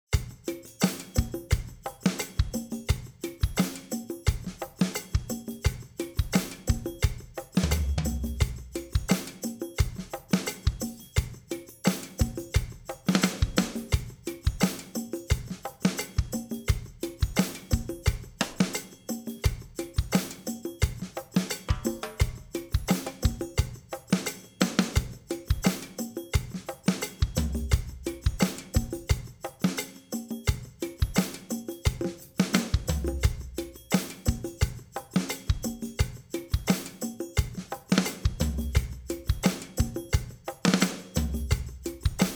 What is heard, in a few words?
Project Funky; admiralbob77